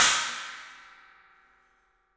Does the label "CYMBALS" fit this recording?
Yes